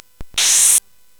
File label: Shuffle, CSG8580R5